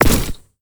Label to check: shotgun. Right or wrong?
Right